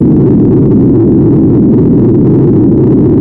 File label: Reload Rocket Fly; PC PowerPlay 79; Mobile Forces Demo; Weapon SFX Rocket Launcher